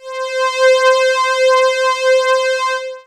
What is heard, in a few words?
Kawai K3 Strings C5